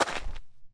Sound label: gravel, Footsteps; zps; Foley